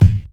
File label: clean kick single hit g key, Royality free kickdrum tuned to the G note